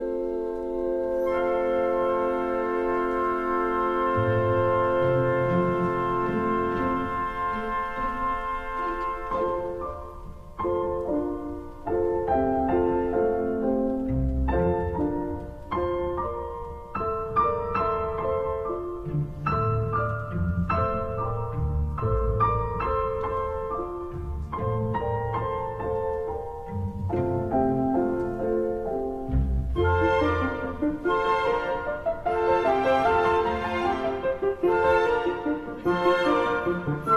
Piano Concerto No. 5 in F major, Opus 103; Egyptian

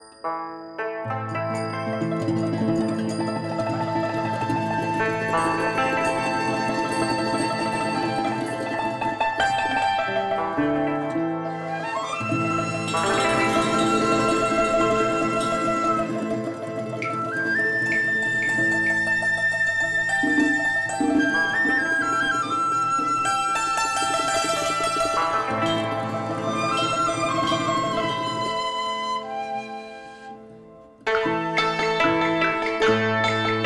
TRADITIONAL Chinese, Picking Flowers

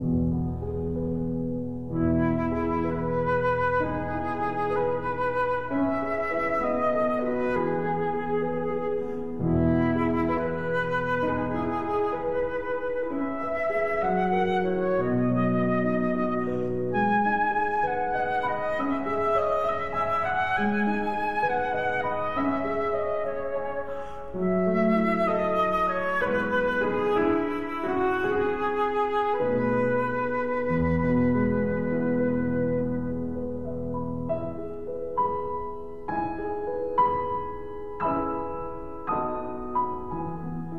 Berceuse (Lullaby) for flute and piano

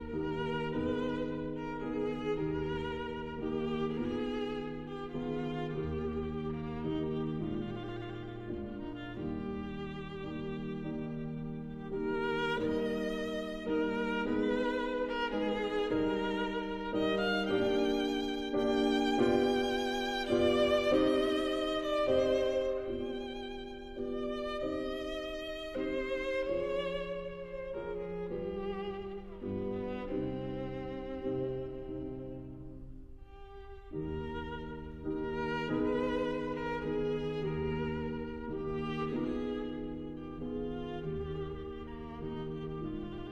Sicilienne for violin and piano

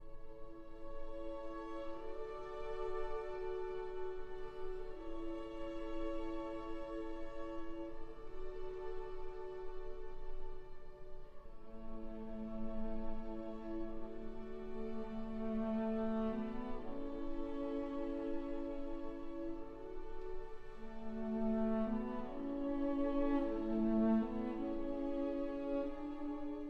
for cello and strings

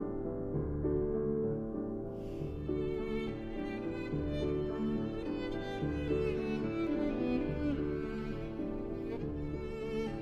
Violin and Piano in D

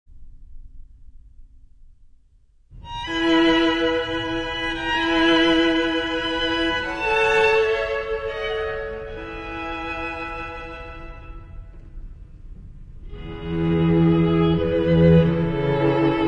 String Quartet Shepherd's Farewell; arr. Carlo Martelli

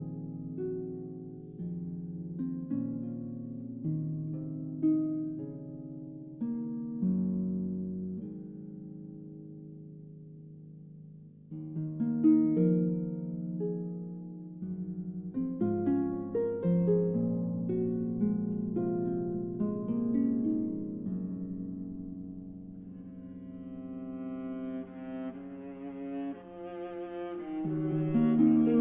Harp Concerto Lullaby

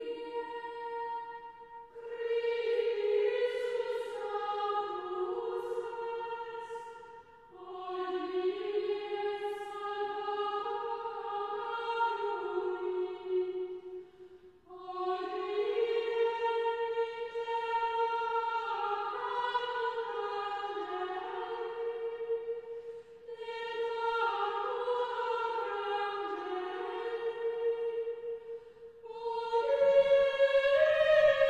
Chant Antiphon; Traditional; Chester Processional

